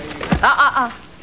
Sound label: ahahah; xena